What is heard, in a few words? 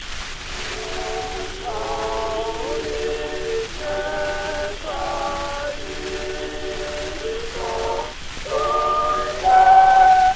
Gramophone